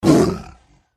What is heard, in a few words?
shankara wound